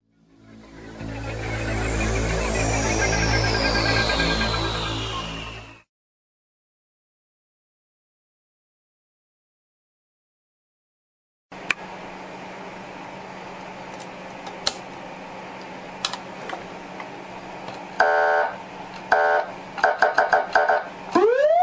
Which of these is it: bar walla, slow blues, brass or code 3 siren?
code 3 siren